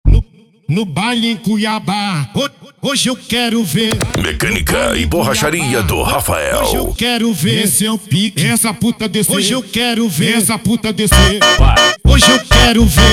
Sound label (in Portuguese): funk ritmado